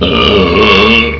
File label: Gas